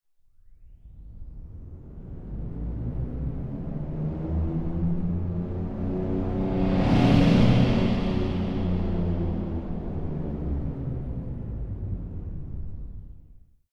Transition SciFi Whoosh Slow Metallic, Transition Slow Metallic Tonal Whoosh; Horror Scifi Science Fiction; Whooshes (Sound Effects:Sound Design)